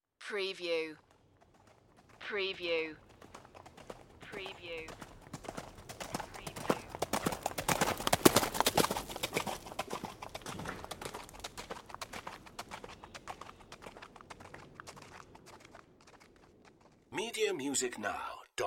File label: Horse Trot By Gravel; Sound Effects Bible